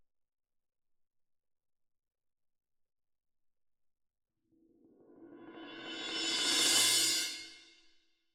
Cymb Close

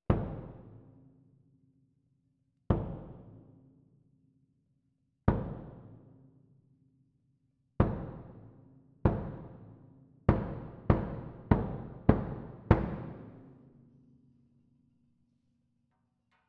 Cassa Main

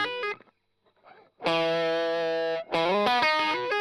Strum Line

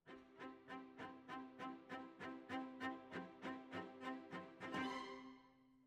Cellos

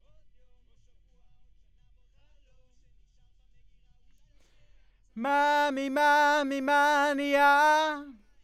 B. Vox

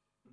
Spring

